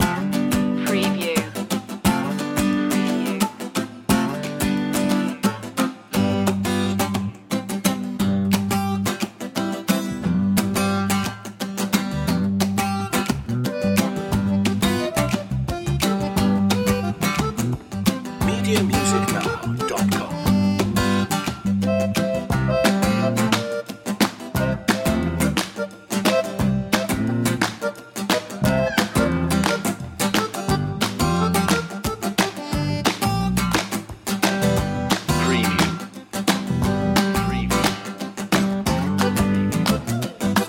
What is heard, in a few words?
creole family warm up